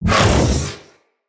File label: Iron Dodge Burst; Warframes